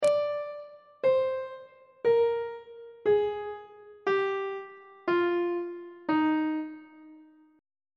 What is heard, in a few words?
e flat ionian mode descending on treble clef